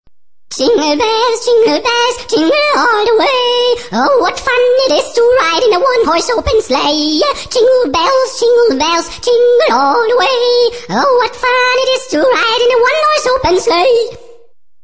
jingle bells funny; ringtones

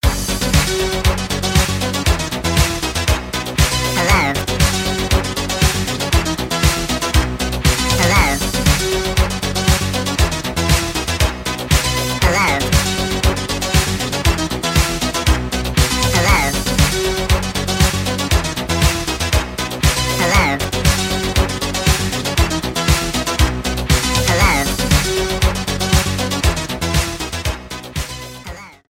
Mellow Ringtone

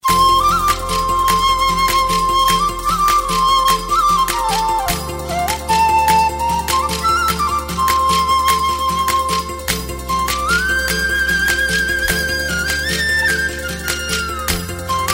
Joyful flute

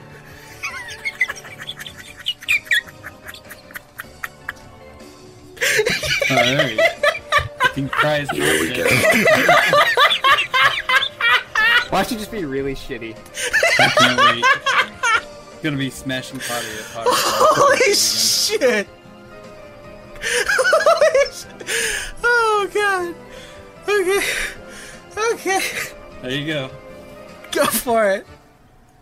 Cry Laugh

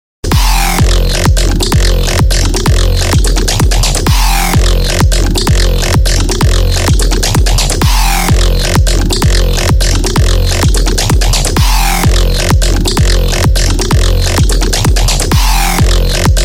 Loud Rider

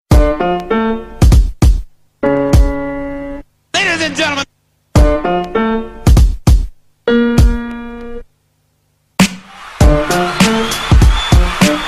black beat, hip hop

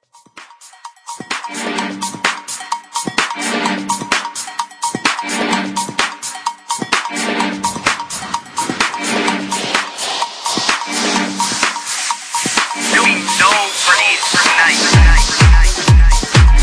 beat

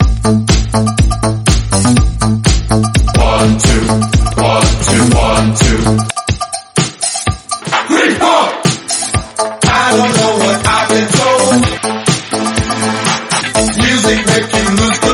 Body Funk

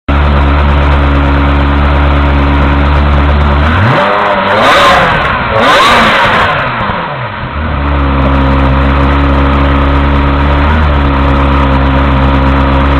engine sound ferrari; alternative

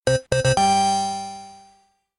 victory text tone; games; ringtones

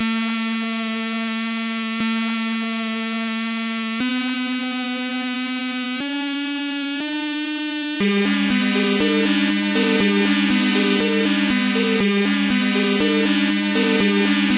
cellphone song

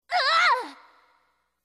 Scream; Melee Ness

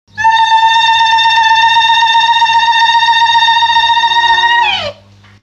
Tongue Trill

